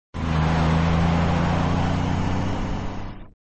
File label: Sound Truck, flipper; Truck v1